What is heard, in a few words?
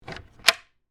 Cabinet Door Slide, Sliding, Lock, Open; Household; BlastWave